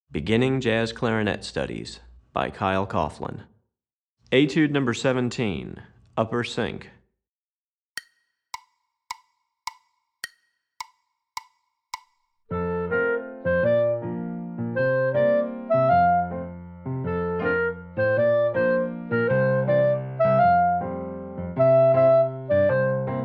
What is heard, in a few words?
Etude, Jazz Clarinet; Etude #17; Recordings For Beginning Jazz Clarinet Studies, Volume 2; Upper Register; Kyle Coughlin